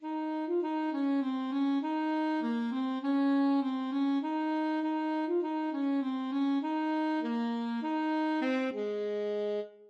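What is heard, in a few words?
alto saxophone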